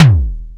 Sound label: DRM G#, YAMAHA R5+RX; Best Service ProSamples vol.55 - Retro Sampler; AKAI